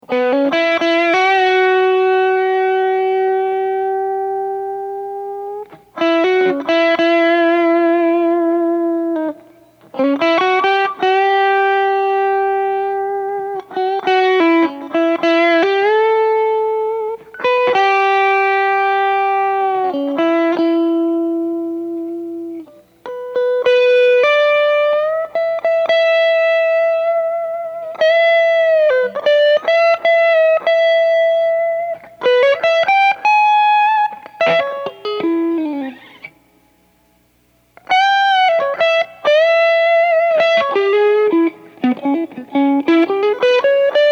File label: Blues OD Blues